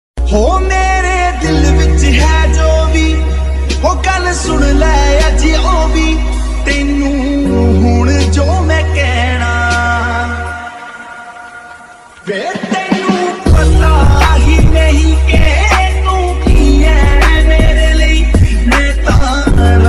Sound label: love ringtone hindi ringtone love ringtone hindi ringtone